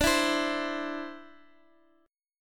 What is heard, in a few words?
D 7th Suspended 2nd down Guitar Open D